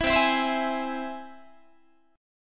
C Augmented down Guitar; strummed; JGuitar

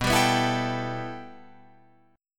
B 7th Suspended 4th Sharp 5th, Guitar, Open F; B7sus4#5; strummed

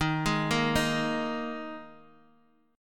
D sharp Suspended 4th D sharp, Guitar Open D; chord shape

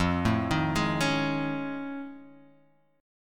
F 7th Sharp 5th Sharp 9th F; F7#5#9 arpeggiated

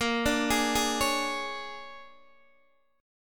A sharp 7th Sharp 9th, Guitar Open D chord; x,13,12,14,11,11; down

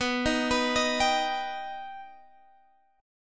B Augmented B Guitar Half Step Down, chord

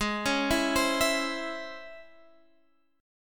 Db Minor Major 7th Ab

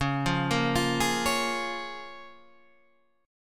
C sharp 6th C sharp down

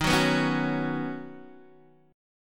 Eb Minor 7th Sharp 5th Eb Guitar DADGAD

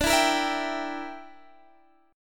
E Minor 7th Flat 5th D

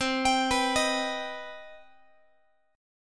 C Minor Major 7th, Guitar; arpeggiated; JGuitar

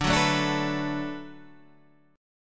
D 6th D down Guitar Half Step Down, chord

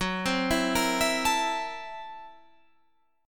F sharp 7th Sharp 9th Flat 5th F sharp

